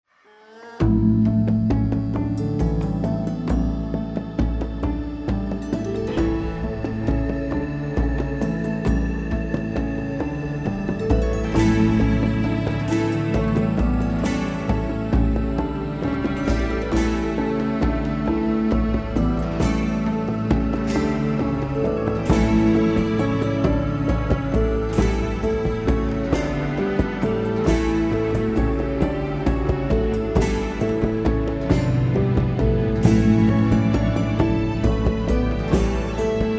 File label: Loop Carefree